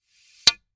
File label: Curtain Rod Slide, Curtain Rod Slide Metal; Windows; Household; Blastwave